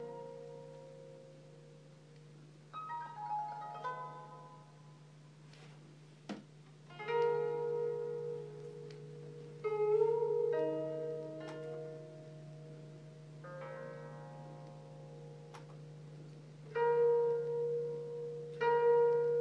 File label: some soothing music before bedtime